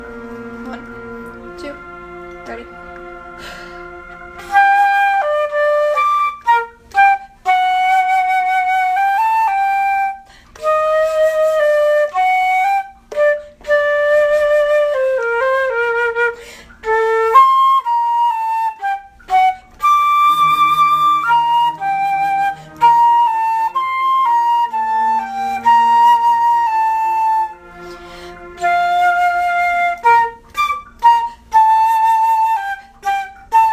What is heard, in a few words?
flute